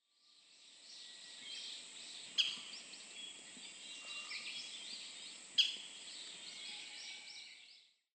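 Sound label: Pacific Golden Plover; hahajima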